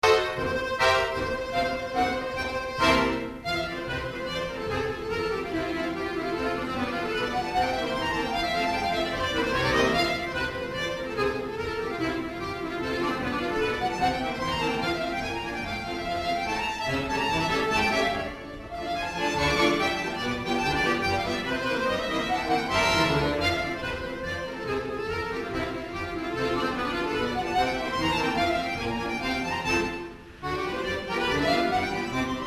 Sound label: Accordeon Steeple, accordion